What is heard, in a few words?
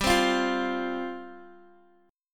G 7th Suspended 4th G, Guitar Open G; down